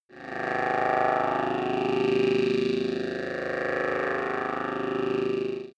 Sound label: starship rotating core